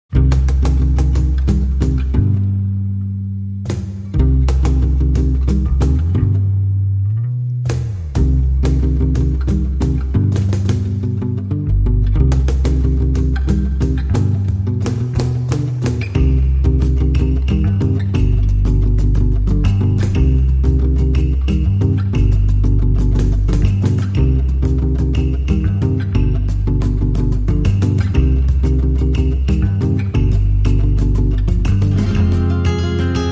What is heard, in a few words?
Peruvian, No Melody